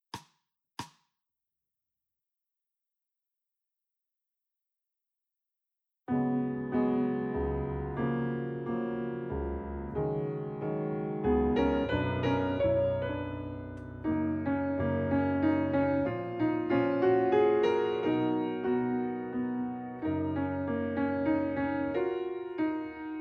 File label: Accompaniment Eb; instrumental solos; Level 3